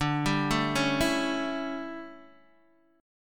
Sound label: D Major 7th Suspended 2nd Suspended 4th D, Guitar, DADGAD